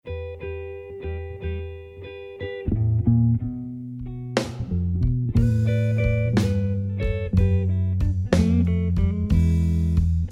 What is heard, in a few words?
Delta Traditional Slow Blues Drumming